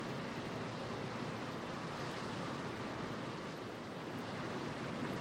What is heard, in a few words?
ship Move; movement; Mindustry